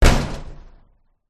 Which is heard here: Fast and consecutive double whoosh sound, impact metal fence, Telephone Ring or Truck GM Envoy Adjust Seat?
impact metal fence